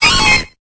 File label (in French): Cri